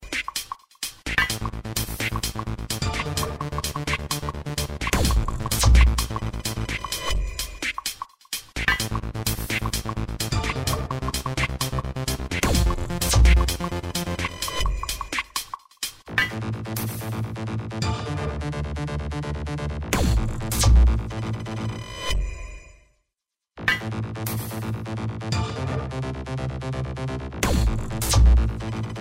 Electro; Capitan

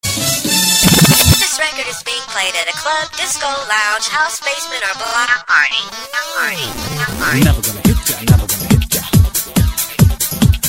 Rock Party

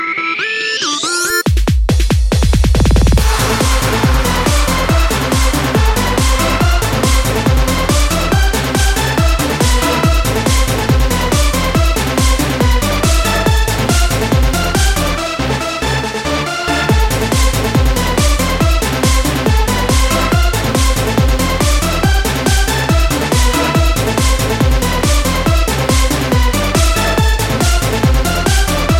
Techno

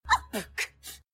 Beatbox; Rarity

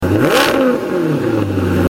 Maserati Gts

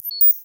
Bip

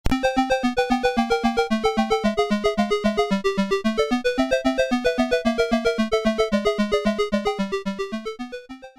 c64; Dig Dug

